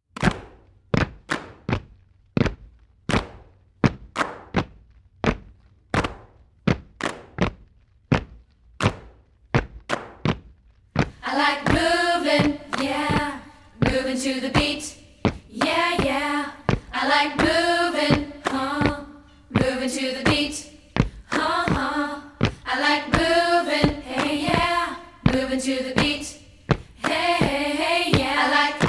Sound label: Movin To Beat a cap